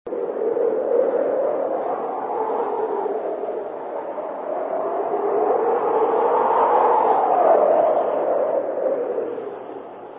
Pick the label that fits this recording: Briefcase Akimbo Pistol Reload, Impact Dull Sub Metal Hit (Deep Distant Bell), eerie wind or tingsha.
eerie wind